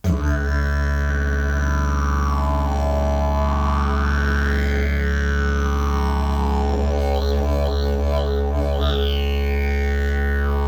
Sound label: didgeridoo; jilm jesterak